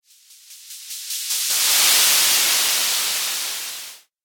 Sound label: Static Sweep And Slide, whoosh sound effect